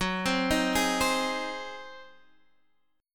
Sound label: Ab 7th Gb